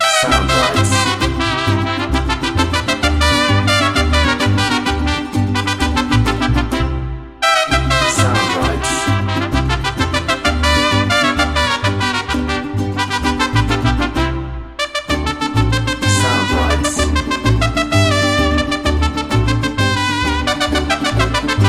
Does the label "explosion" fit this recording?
No